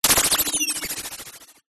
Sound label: sound design fm modulation burst; zapsplat